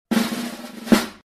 short drumroll

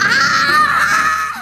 scream; kuromi

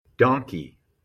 donkey

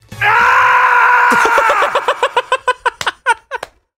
scream; therapy

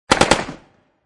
uzi shooting

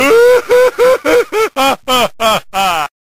laughing crazy

